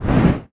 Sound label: Swing Windy